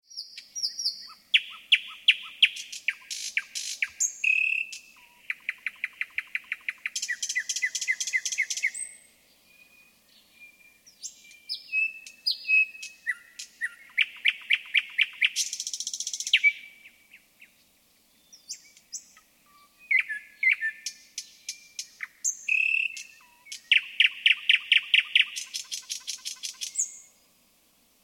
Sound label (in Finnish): satakieli; laurinmaki